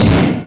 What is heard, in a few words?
crush; Nintendo